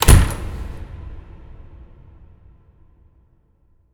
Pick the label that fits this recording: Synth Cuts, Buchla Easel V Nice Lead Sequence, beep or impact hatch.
impact hatch